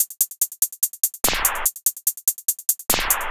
Fast Hi-Hat; Sonic Foundry (Sony Creative Software); Club Joints and Street Anthems

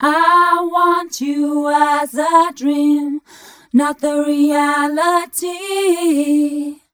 f mel d, fem Vocals

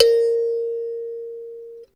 thumb piano; Zero-G Ambient 1

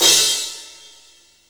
CRASH, CYMBALS; Back In Time Records - Big Bang

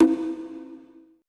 CONGA R; BPC DRUMS

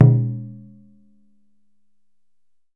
DJUN DJUN, African Drum; E-MU Producer Series Vol. 7 – Old World Instruments

